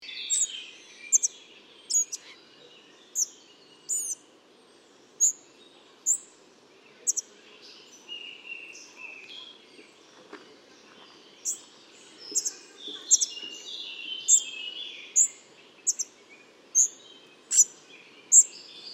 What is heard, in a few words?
Spotted Flycatcher